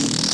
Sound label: aliens